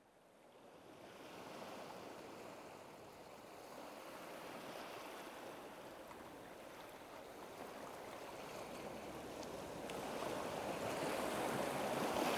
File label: Tranquil Ocean Waves; Insight